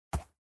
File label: soft